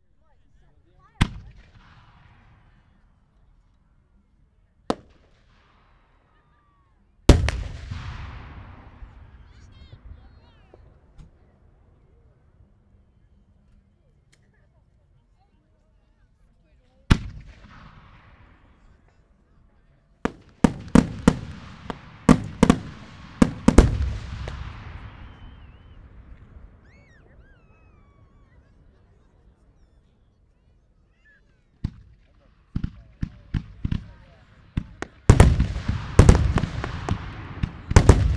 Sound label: fireworks